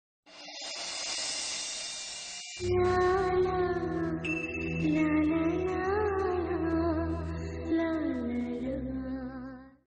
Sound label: title background music; sasirekha parinayam